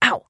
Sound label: trader pain; 商人杰恩（七日杀）